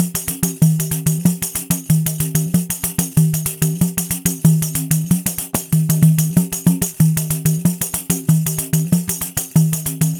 samba 94 pandeiro; gen 04 stems samba baiao

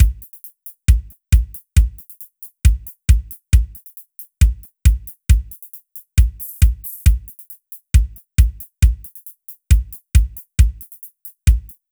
arrocha 68 bateria

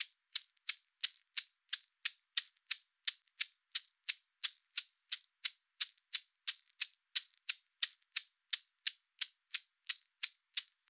choro dolente 88 ganza

